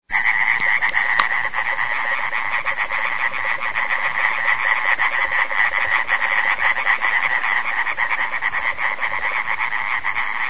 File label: ribbit